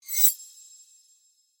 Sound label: High Scrapy Whoosh; Slava Pogorelsky; Crystal Clear; Sharp Realization